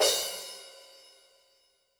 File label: Crash Cymbal Brush; YAMAHA RM1X